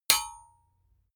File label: sword metal hit hard